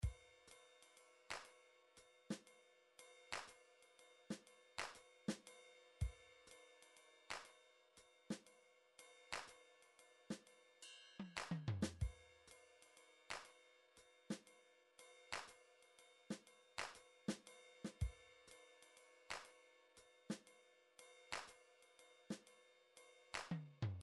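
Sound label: drum midi jazz waltz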